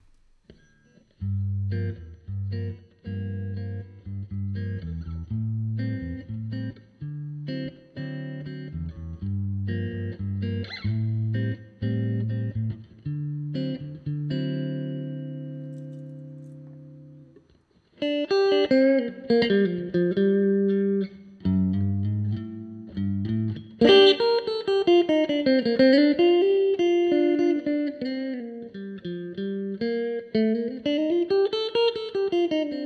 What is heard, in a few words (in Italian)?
zoom diretto